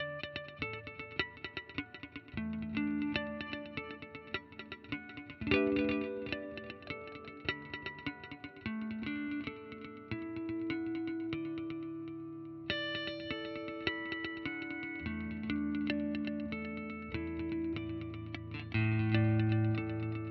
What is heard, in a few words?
Mooer GE300 Lite Multidelay